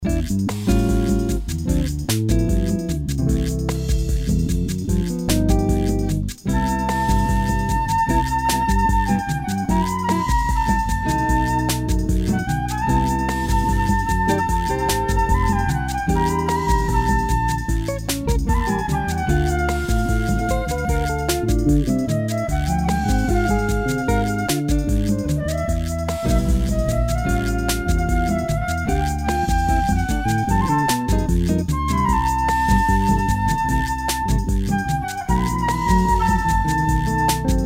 Trio

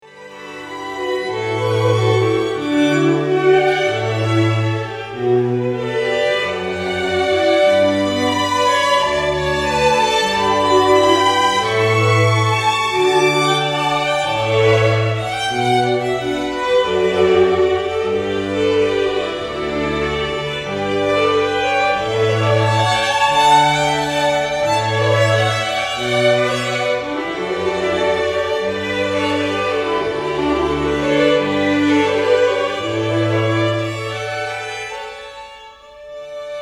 Canon In D Quartet Short Version; Pachelbel

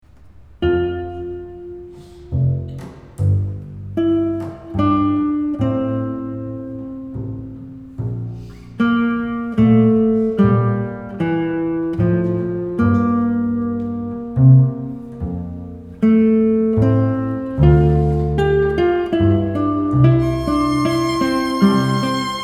Largo from Double Violin Concerto; Bach

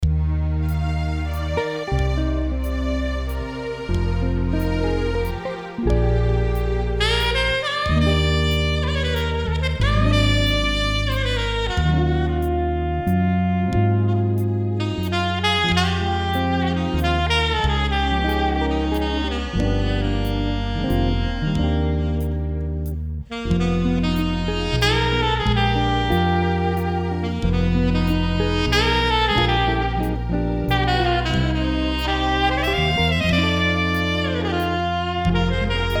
Jazz Duo

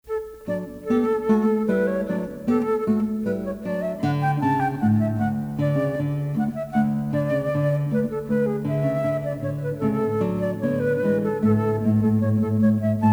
Classical Guitar Flute

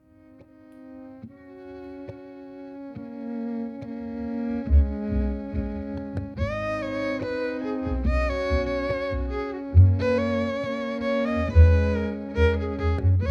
2 violin

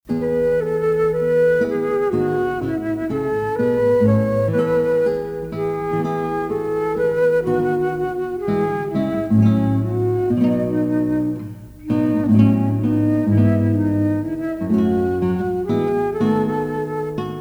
Classical Guitar Flute

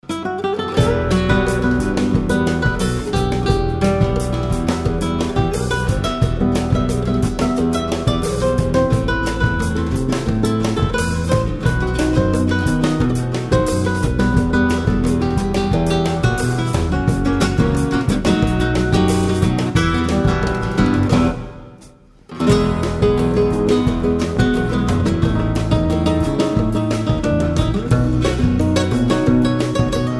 Jazz Trio

